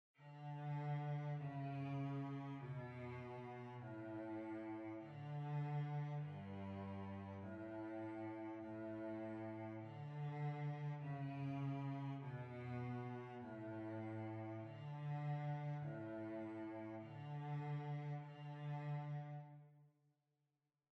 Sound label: Violoncello